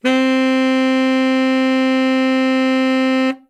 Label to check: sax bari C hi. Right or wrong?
Right